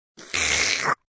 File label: Slurp, Slurping sound.; Human